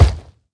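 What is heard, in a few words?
hoglin step, 疣猪兽：脚步声; Minecraft; 疣猪兽是一种生成在绯红森林的攻击型生物。它们是目前唯一可繁殖的攻击型生物。; 疣猪兽（我的世界）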